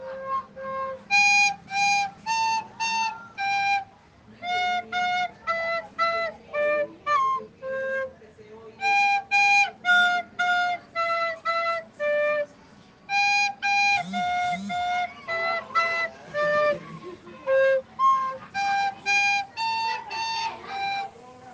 flauta

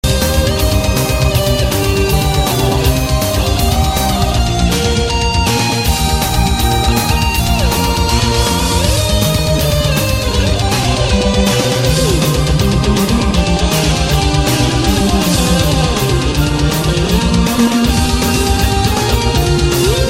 Himno Nacional

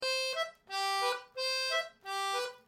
Acordeon

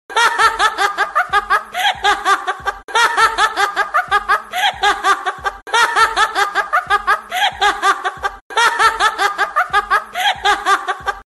Risa cautivadora

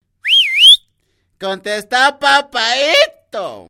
Chiflido

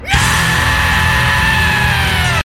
Grito

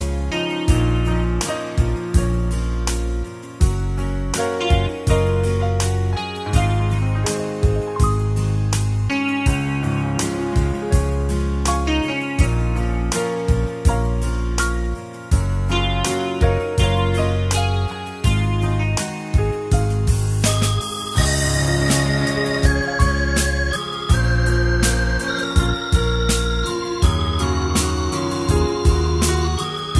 musica romantica

